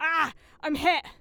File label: hit; EndlessVendetta; Barklines Combat VA